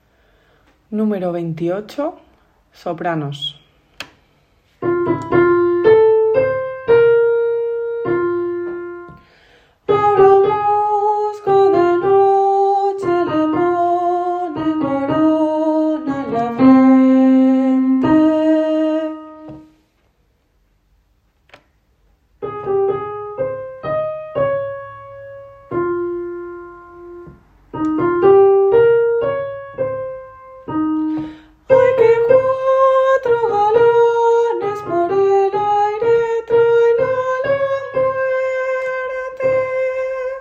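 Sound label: soprano; BodasdeSangre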